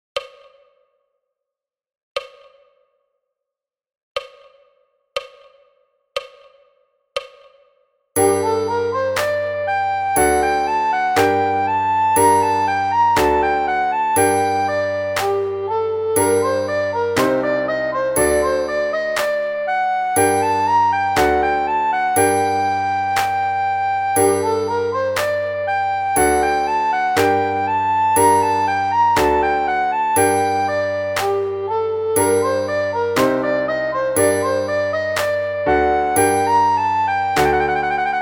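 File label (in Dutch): alt snel tempo, blokfluit; Tureluurs 3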